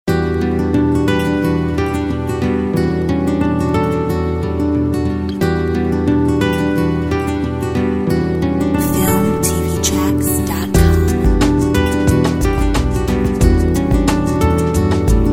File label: Chill out